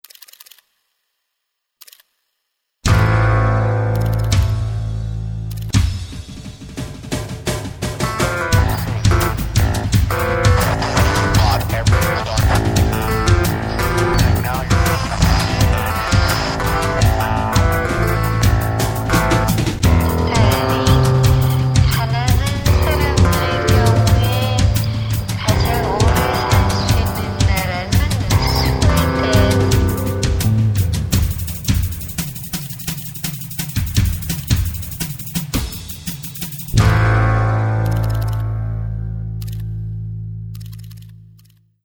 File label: bg noise